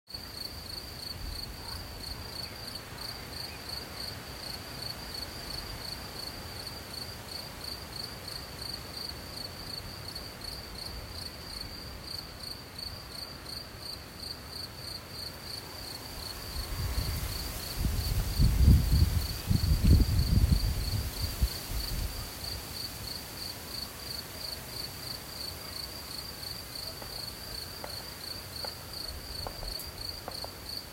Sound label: soft wind with crows and birds in nature; cricket